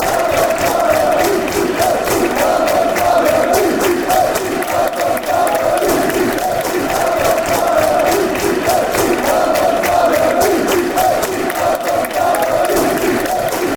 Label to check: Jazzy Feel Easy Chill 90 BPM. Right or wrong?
Wrong